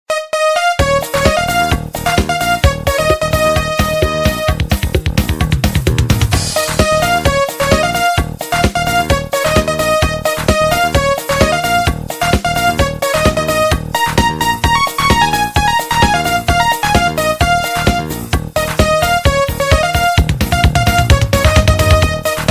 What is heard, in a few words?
remix ringtone